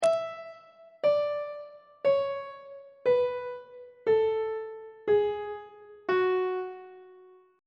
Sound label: f sharp minor scale descending on treble clef